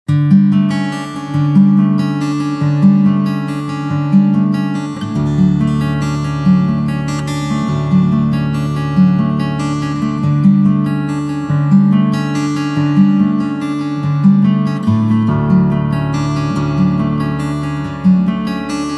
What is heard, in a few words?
Arp Guitar PLATE; breverb